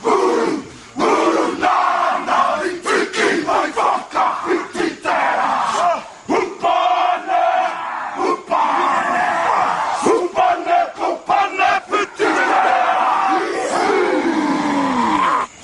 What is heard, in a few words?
haka